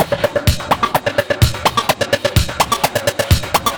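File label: No bass drum, Drum Loops 127bpm; Planet Emergency; Nu Dance Electro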